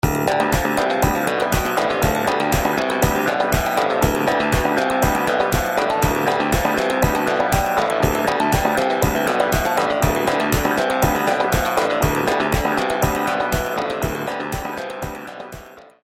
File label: TESTSOUND Ticky Clav